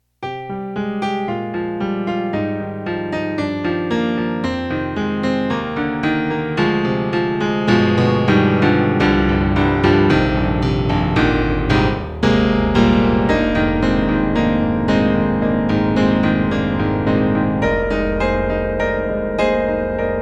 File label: Piano 16'; KORG NX5R